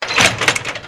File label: equip; tokamak